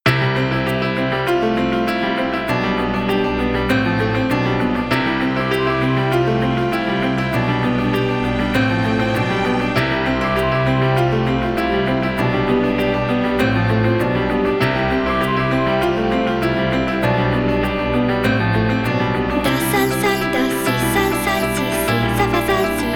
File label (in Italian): Polifonico